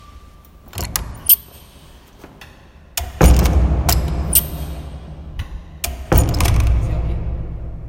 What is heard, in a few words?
Screech